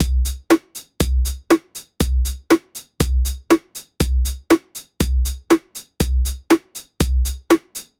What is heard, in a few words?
binaural beat theta